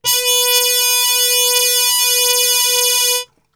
B 2, Brass; Brass Super Section; Best Service

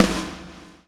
fill

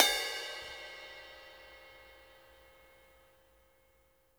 BELL, Drumworks; Sampleheads; New York City Drumworks VOL-1

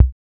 CR-8000 KIK; R+B KITS2

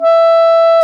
WND CLARI, Clarinets, Clar Bryt; Roland LCDP04 Orchestral Winds; 90 s Sample CDs

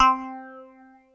throat, JD8 attack

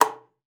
KLPA A4, ALTOSAX; Xsample VOL-2

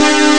Noise Sus2; rare gems

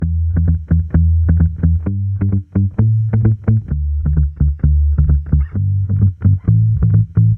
E Bass Dotted, 130; Zero-G; Future Wave

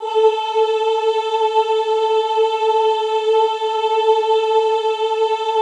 OOH A3, Classical Choir; Best Service; Extended Classical Choir; OOH MIX

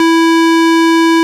ORG Farfisa

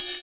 Ride; Equipped Music; Loungin House

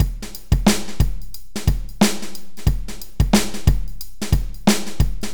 BPM 90 AMB; AKAI S-Series CD-ROM Sound Library VOL-4

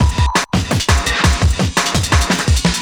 Beat, Drum N Bass

